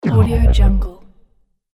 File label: cinematic stop